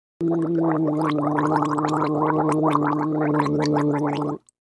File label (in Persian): gargle, صدای غرغره کردن